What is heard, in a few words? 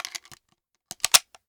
Reloading begin; DungeonShooting